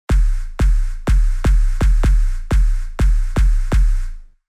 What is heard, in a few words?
Power Kick